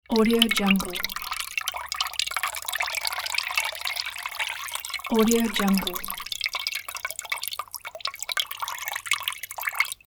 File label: water pour dribbles